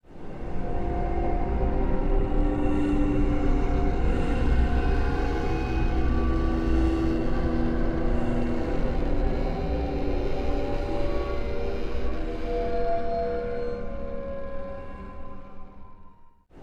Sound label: ui loading loop